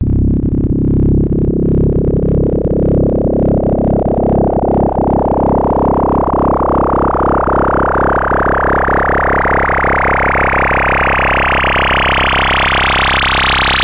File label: angry filter journey, Synth